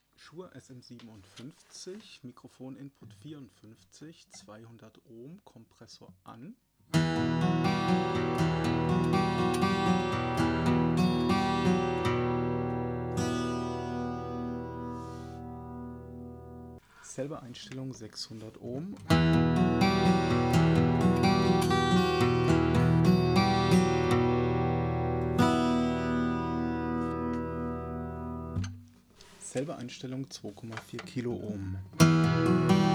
Taylor12 mit dynamik Mic SM57 Comp an

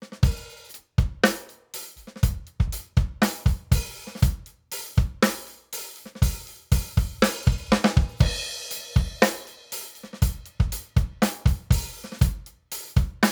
API Audio 2500 Drum Bus ohne Kompressor

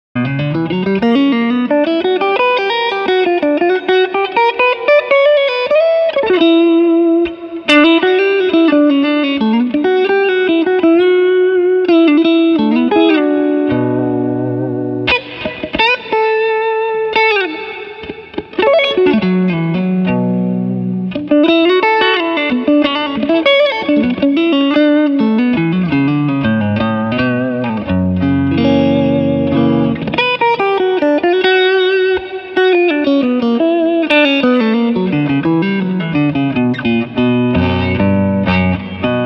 Reverb Pur

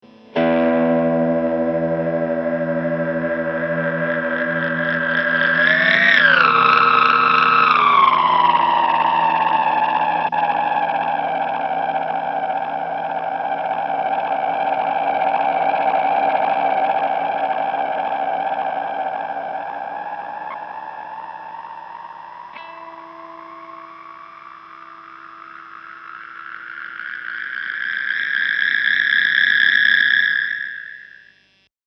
selfosz

